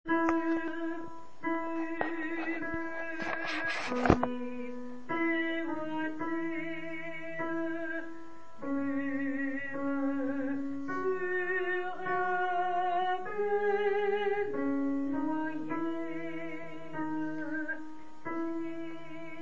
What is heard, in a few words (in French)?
Ténor Voix; Brume